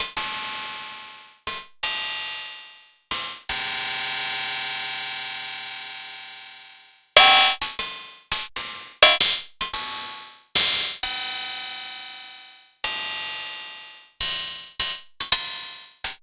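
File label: ms20 processed cymbals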